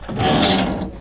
door screen move, doors; Source Engine; video game

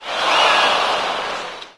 baseball maximize, windows 9x sound schemes

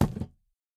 phys cube, physics

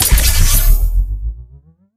portalgun activation; weapon ambient; Source Engine; Portal 2

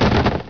bust crate, debris; Source Engine; dod

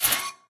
editor panel lower, ui; Source Engine; Portal 2

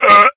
death, infected male; left4dead; Source engine

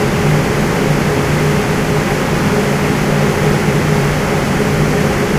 inside tape machine; video games; ambient; Source Engine; tf; lair

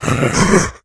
become alert, infected

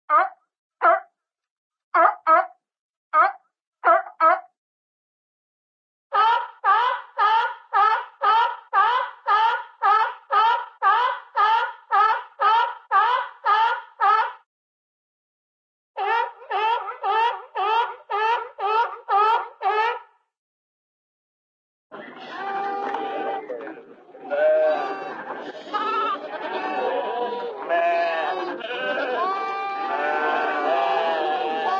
seal seal barks, animal; cartoon

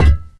physics cube impact soft

